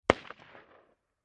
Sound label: M-16 Long Barrel Machine Gun Single Shot From Distant Point of View